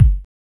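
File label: BASS DRUM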